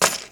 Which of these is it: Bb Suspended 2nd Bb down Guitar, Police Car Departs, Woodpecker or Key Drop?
Key Drop